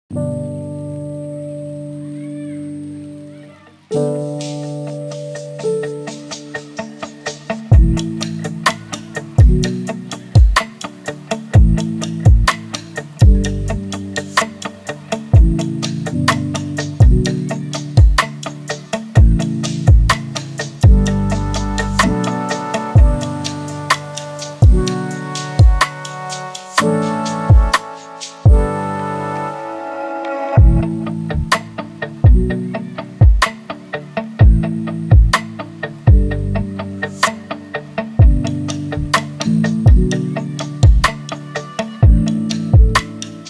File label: lofi hip hop